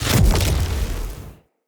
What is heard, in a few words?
Casting Device Impact Damage; Weapon Impacts; Casting Devices; Fenn